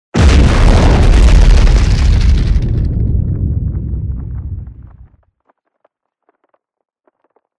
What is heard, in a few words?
exploson